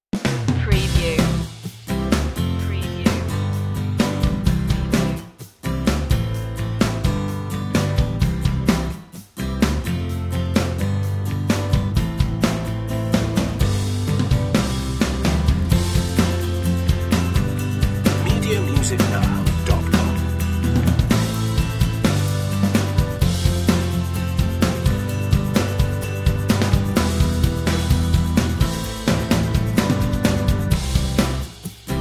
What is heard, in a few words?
no melody